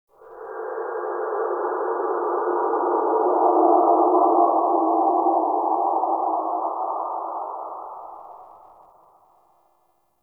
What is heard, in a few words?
Forest Cave Howl; Ambience; Warframe; Grineer